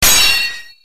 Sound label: tyrant fang